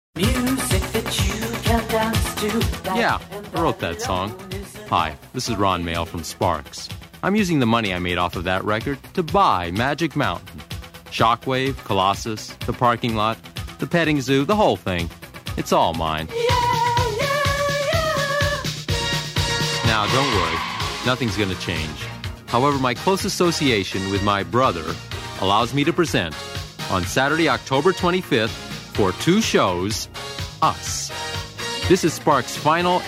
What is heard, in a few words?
Jingle; Sparks; Announcing Magic Mountain Concert